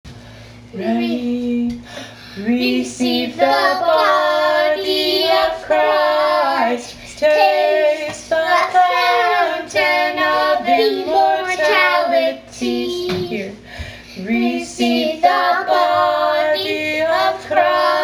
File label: Communion Hymn